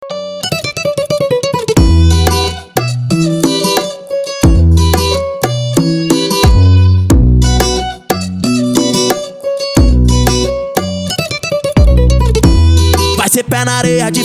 funk consciente e funk ritmado

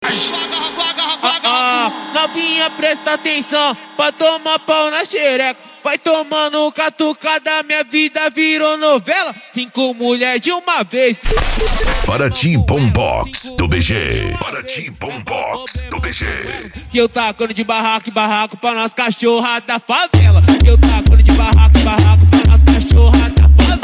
bombox funk

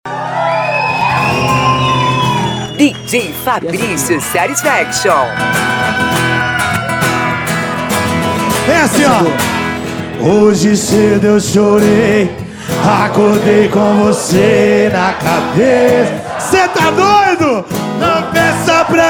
sertanejo modao; barbearia padilha alto paraguai

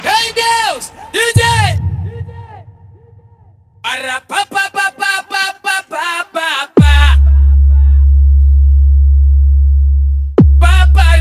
retro

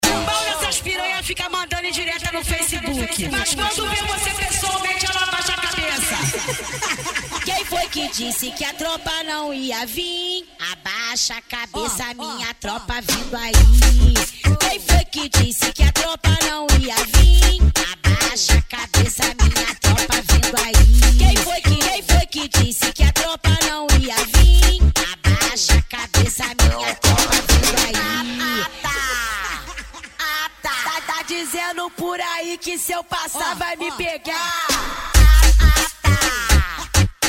funk pisadinha sertanejo

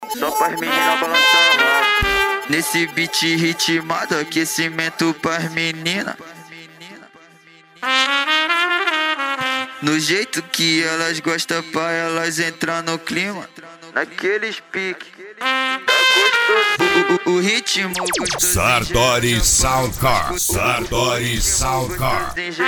sound car; reboque poseidon sartori

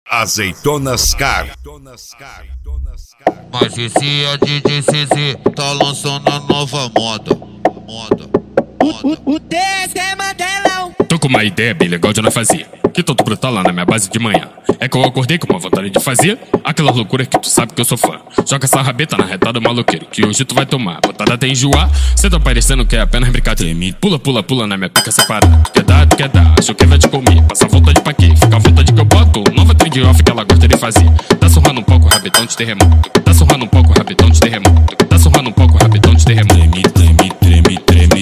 esp insane sound; azeitonas car; barretos

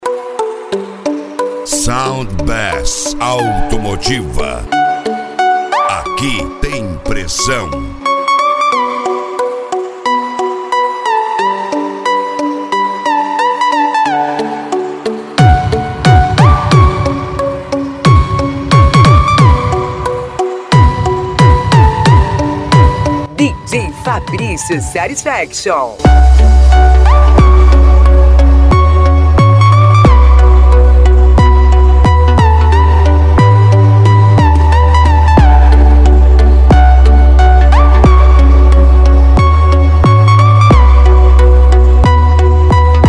sound bass automotiva; uba mg

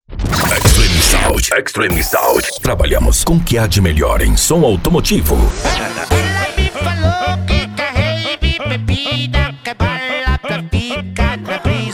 funk midback

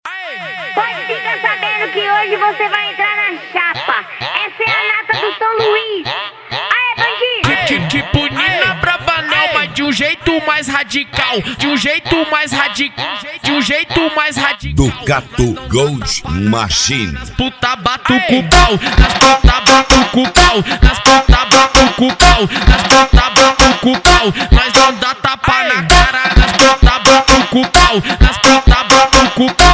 especial funk

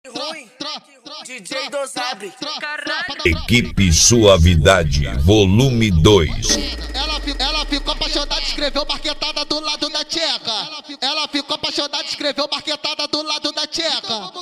especial baile funk e sertanejo

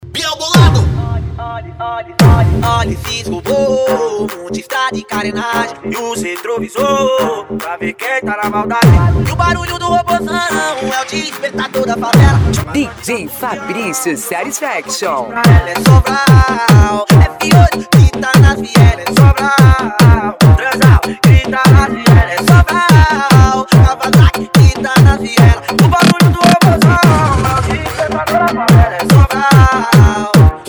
pancada

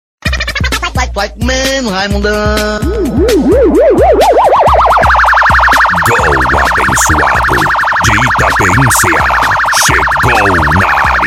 sirene; gol abencoado de itapeim

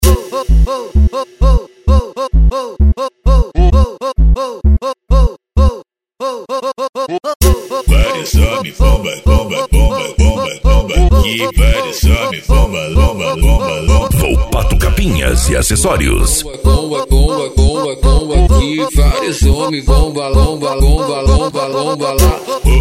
funk consciente e hip hop

